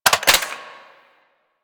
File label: Hand Shotgun Mech; Grineer